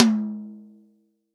TOM, Real Drum Kit, POP KIT; REAL DRUMS; Best Service ProSamples vol.43

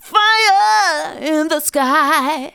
vocals sung; Techno Trance Essentials

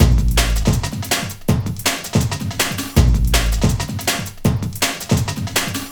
162, Loops, breaks; M8

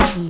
SD, Dance Mega Drums; Best Service; SD-HIP

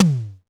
tom, Tama TS-305

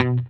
EG MUTE B3; Sampleheads; Whole Lotta Country 1 & 2

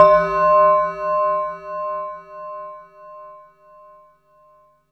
Synth Bells; Dream Zone

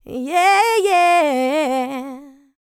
LICKS, Vocal Planet, R&B; ILIO; Dance; LT R+B

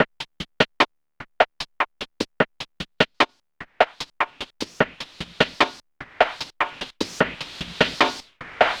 GRVD, Drumz, Grooved; E-MU Producer Series Vol. 6 – Analogia Project

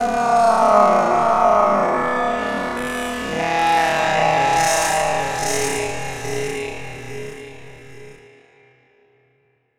Fx Vox; Equipped Music; Loungin House

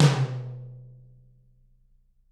TT, Toms; Bob Clearmountain Drums I

